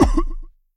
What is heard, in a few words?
cough; Heaven Studio Plus; ringside